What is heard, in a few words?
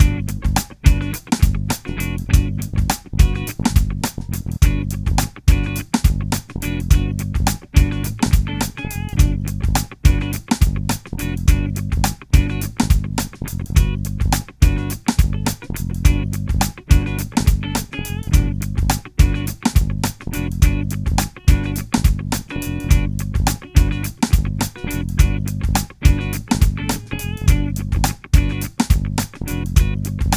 bridge clean, gibson sg; sg goddess